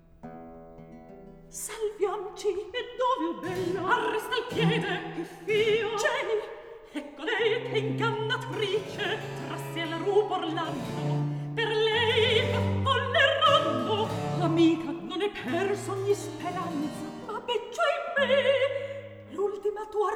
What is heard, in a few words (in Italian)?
Recitativo; Scena 12; Angelica, Medoro, Bradamante, Alcina; Antonio Vivaldi (1678 - 1741); Vivaldi - Orlando furioso (2004); Atto III